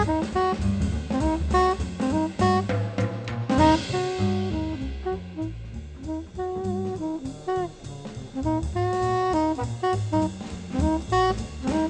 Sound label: jazz